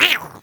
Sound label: NPC Hit